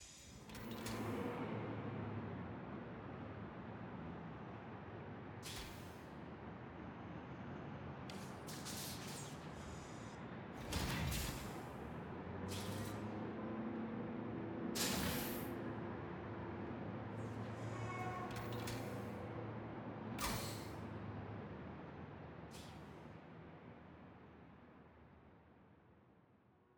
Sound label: Office Window; Silco